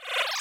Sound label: Smooch